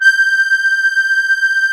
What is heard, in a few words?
HOHNER, akkordeon